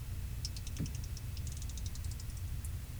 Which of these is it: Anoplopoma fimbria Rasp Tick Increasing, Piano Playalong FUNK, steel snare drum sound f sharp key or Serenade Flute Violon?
Anoplopoma fimbria Rasp Tick Increasing